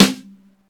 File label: airy steel snare drum sound g key; snare drum samples